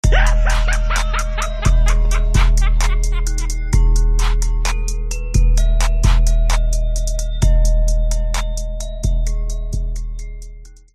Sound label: type beat